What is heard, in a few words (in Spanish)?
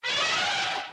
ELEFANTE ALTO; mekanta; jungla